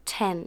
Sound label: Female vocals and robo-vox